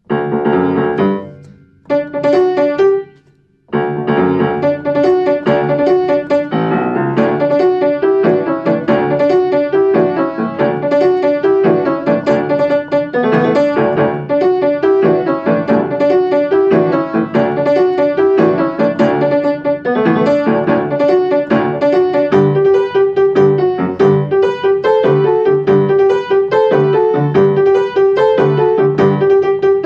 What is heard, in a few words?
Square Dance, piano solo